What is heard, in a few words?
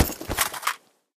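inventory ruck unload weapon; STALKER; IWP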